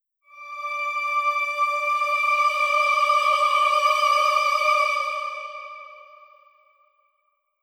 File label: Loop End C